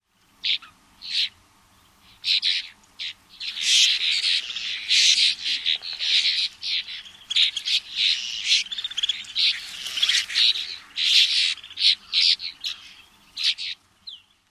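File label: winter flock calls, Snow Bunting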